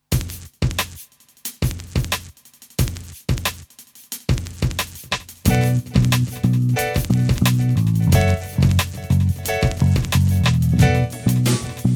Lexicon Alex Delay

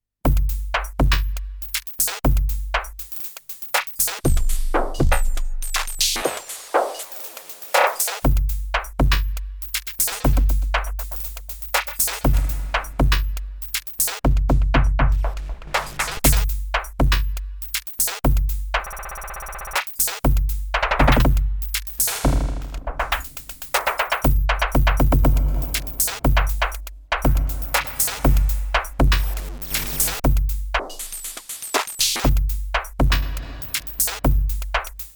Polyend Play Perform Mode

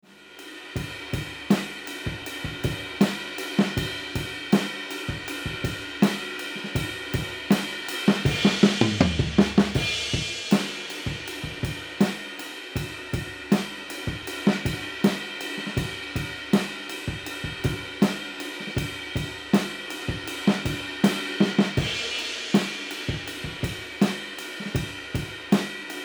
Drums Room; RNR1

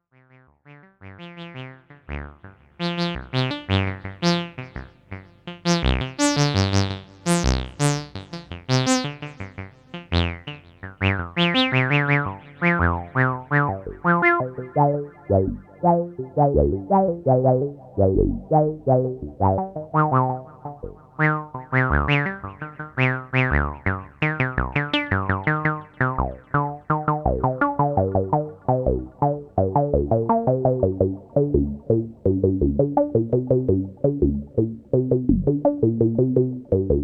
teisco 60f

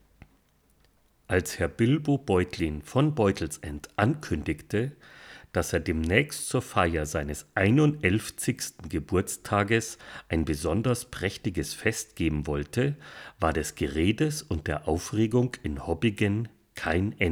SM58s; Se Electric DCM6

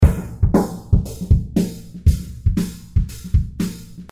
Loop Deep Sweep

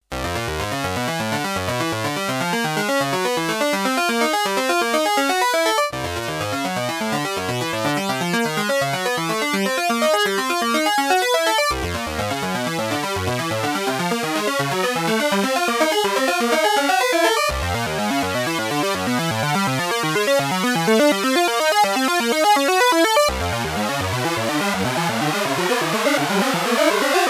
VX90 Poly Dual Uni

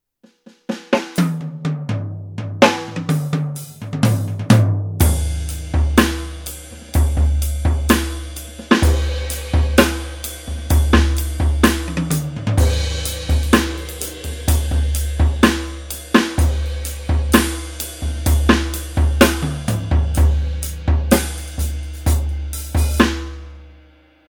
EXS3 Swing Kit; ATV

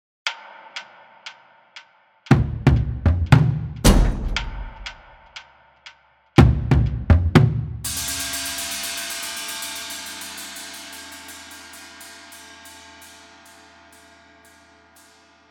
Kit Designer Trailer Kit

